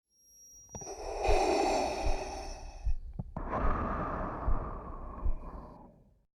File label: Darth Vaders Breathing